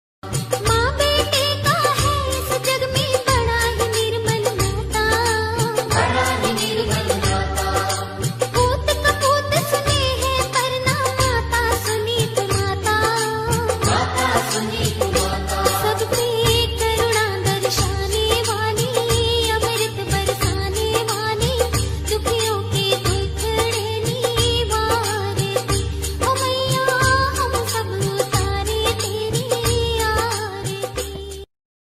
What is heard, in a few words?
navratri special ringtone bgm callertune; happy navratri all of you